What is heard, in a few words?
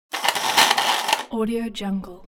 camera focus motor